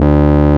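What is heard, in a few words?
RMI piano D 1, EP; Sonic Reality - Vintage Timetraveler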